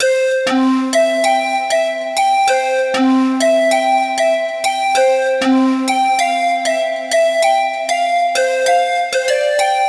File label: Synth